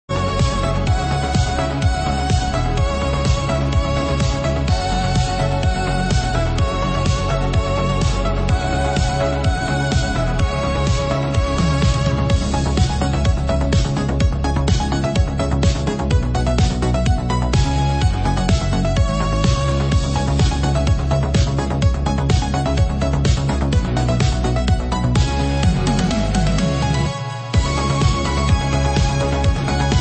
synth version; The Way of the Exploding Fist

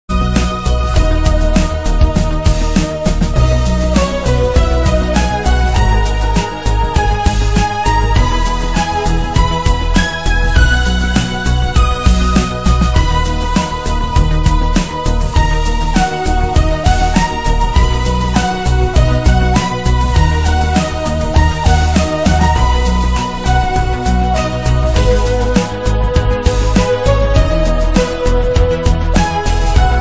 Synth Sample

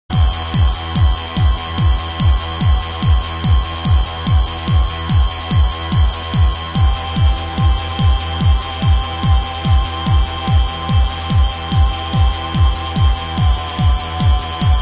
145BPM HARD TECHNO RMX

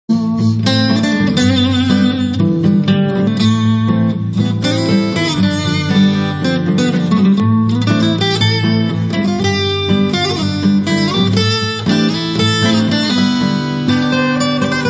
Acoustic; Out Run

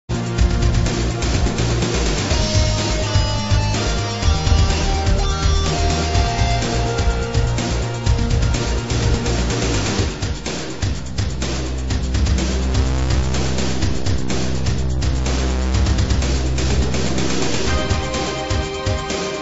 80s Synth Rock mix; ProBMXRMX

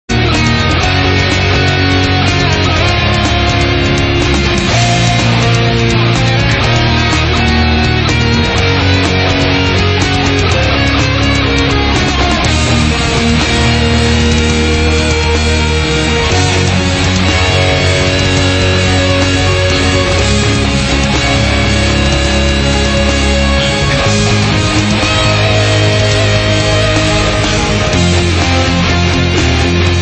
Metal Version; Delta

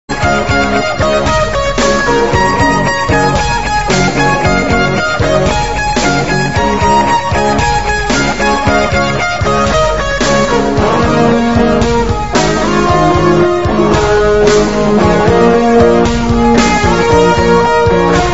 Rock band style; International Karate

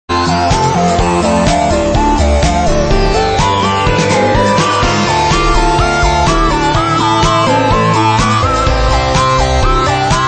Sine Tribute

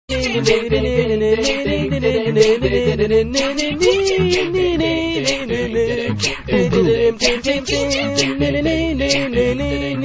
Capella; Commando

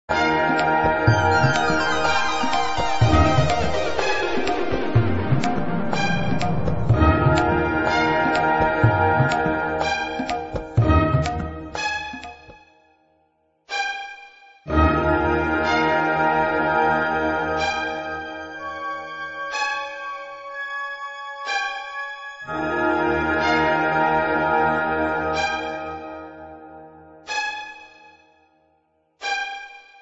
Orchestral Bhangra Gumbo Remix; Wizball

